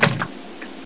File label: slosh, player; hl2